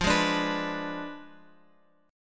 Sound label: F Diminished 7th F down Guitar Open F; Fdim7 strummed; JGuitar